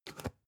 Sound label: spice jar big scratch close soft; industrie wirtschaft; gastronomie